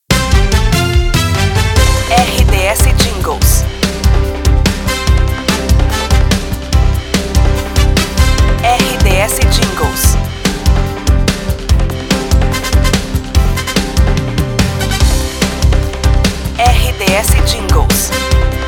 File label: promo trilha promo; Pacote Silvestre FM, Itaberaí/GO; Estação com características popular